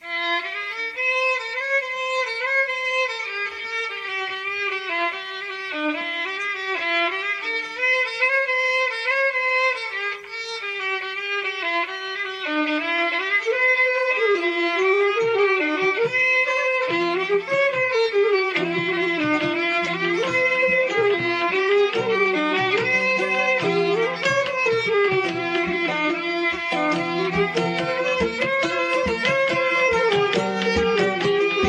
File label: welsh pipe tune